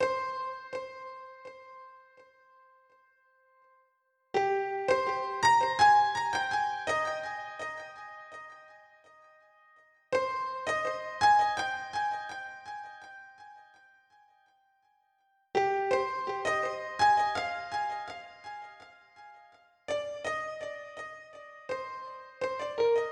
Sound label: Soul Piano